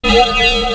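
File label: gauge; Player SE; Nintendo; July 2020 Gigaleak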